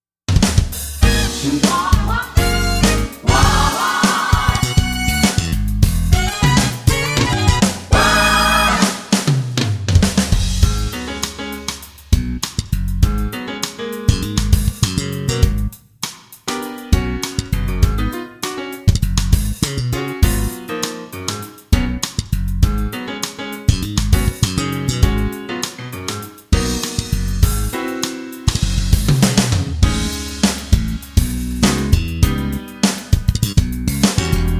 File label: Funk Short